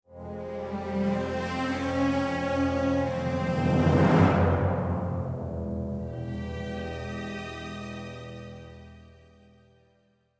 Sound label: failure, music flourish